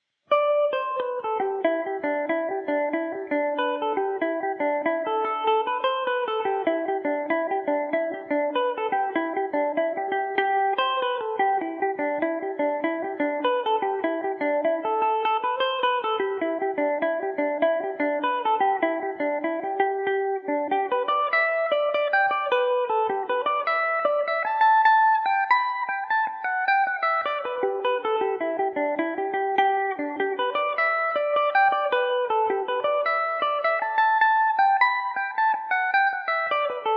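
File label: G major revelation, Irish mandolin; have a drink with me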